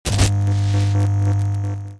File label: jukebox